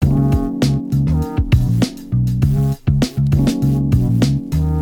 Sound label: Hip Hop Beat 100bpm